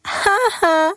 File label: LAUGH